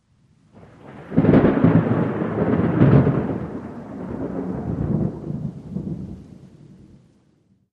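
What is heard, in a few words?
Thunder Crack Rolling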